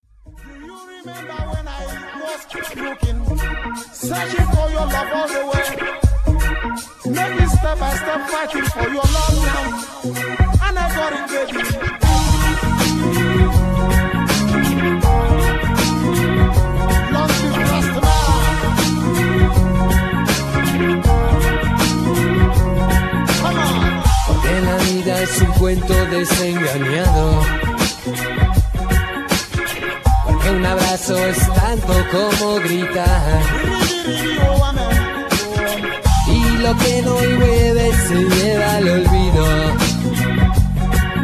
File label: latin reggae